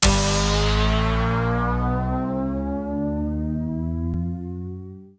Bonus Wheel Symbol Anticipation; ClubFusion; Showtime Classic

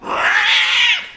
Nasty Kitty; Balls Of Steel

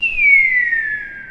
Fall; OverTheBrink

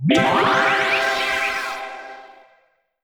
Bonus Sound; SuperFruitChutes; ClubFusion

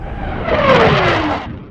Zoom; LuckyPunch; ClubFusion

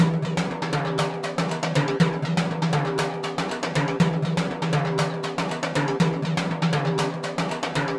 Drum Roll; MexicanaChillitime

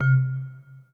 Button; Classic Roulette

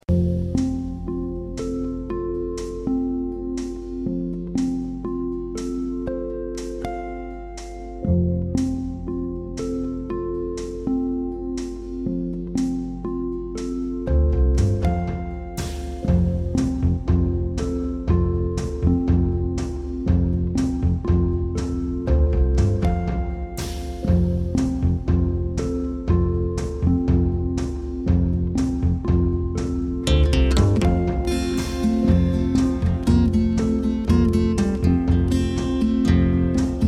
Background; PubQuizKnockout

